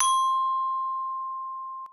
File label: c glockenspiel